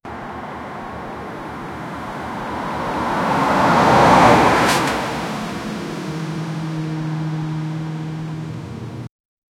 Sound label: car collides, wreck